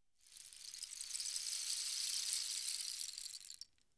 Rain Stick; Willow

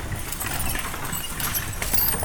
Horse Drawn Wagon By; Electa Quinney

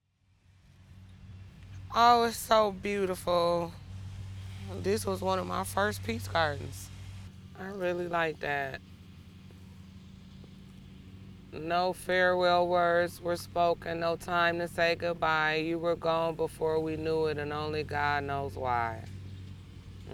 lawn mower; Wisconsin Life; Peace Garden

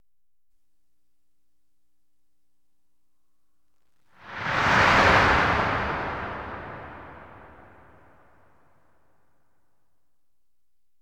Whoosh on Dissolve

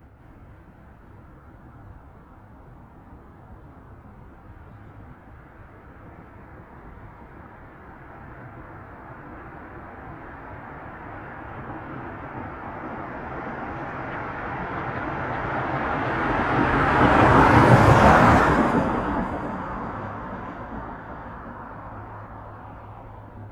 Generic Car, Pass By, Fast, Desert, Ext; Poetry Project

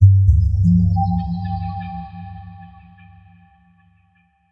Ethereal; Electa Quinney

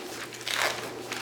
CLOTH RIPPING

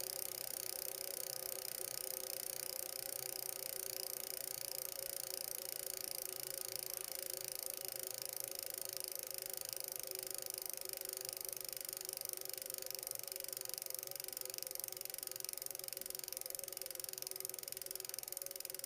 bike freewheel; SAUK PRAIRIE

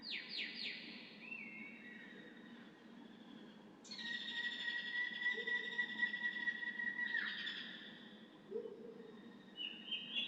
Jungle, Daytime Atmosphere; Poetry Project

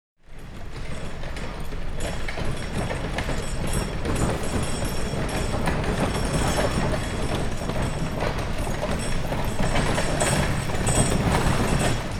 Wagons By Slow; Electa Quinney

